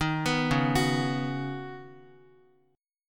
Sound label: C sharp 7th Suspended 2nd Suspended 4th C sharp down Guitar; C#7sus2sus4; arpeggiated; JGuitar